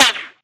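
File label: impact bullet generic titan shield; Titanfall 2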